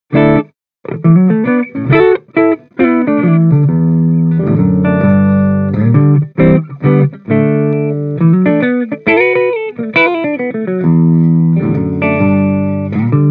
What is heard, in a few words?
Clean, Les Paul; Mini Rox Mic Inside; Seventy 80